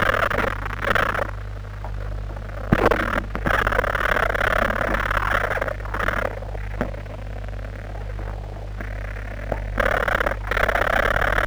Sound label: worker ant sound